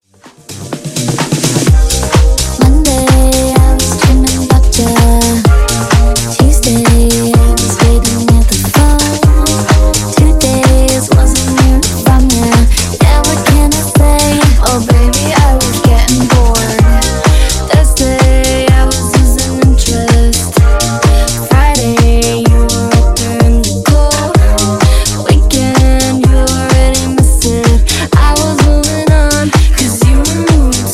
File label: Dizko Funk 127bpm; remixplanet